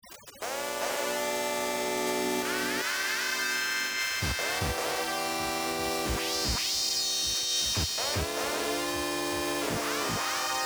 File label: Kaossilator